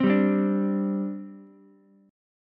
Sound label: C Suspended 2nd C Ukulele; Csus2 strummed